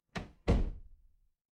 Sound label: door close